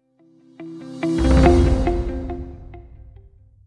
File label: Corporate Transition